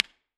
AC30 normal U67; IRs

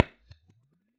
Science 2x15 3015 MD421 Port, Bass Cab IRs

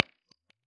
Science 2x15 15PR400 SM57 0IN, Bass Cab IRs

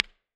AC30 normal BX44 neve close, IRs

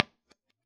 4x12 G12H-150 U87; 2.0in; IRs

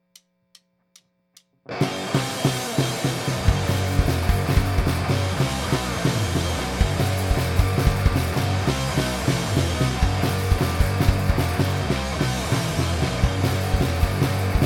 with bass

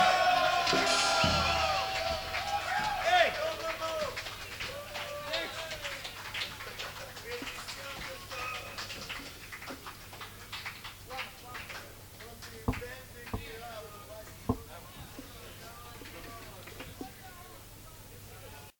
End Noise; Live in MKNZ Bistrica, Slovenia 12-09-01

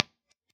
4x12 V30 MD421; 2.0in; IRs

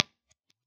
Science 4x12 G12M-65 MD421 0.5in, IRs

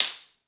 jazz chorus sm7; IRs

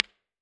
AC30 brilliant bx44 neve close, IRs

